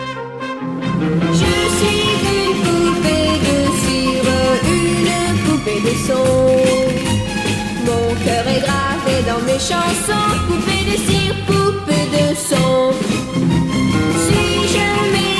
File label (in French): sonnerie